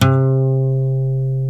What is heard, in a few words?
GUITAR FLUTE